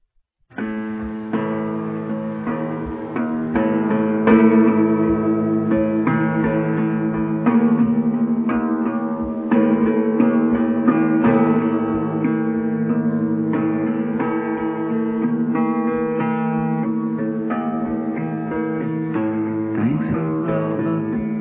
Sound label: Feathered Drums